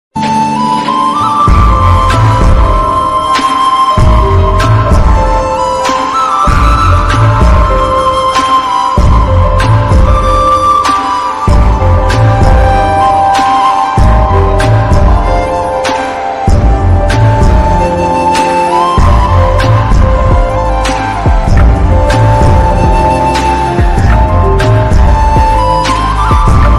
world best flute ringtone new flute ringtone love ringtone romantic ringtone hindi ringtone